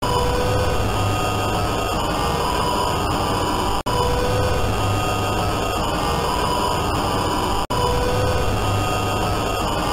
chase sound; next bot apariton

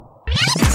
rewind

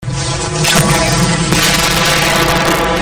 perfect explosion; killer queen

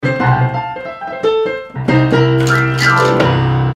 riff

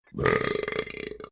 burp

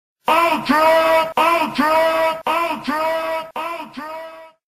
ultra combo sound effect snes style; killer instinct

